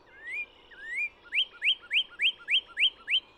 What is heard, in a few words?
wetlands noca